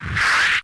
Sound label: Attack; RedDot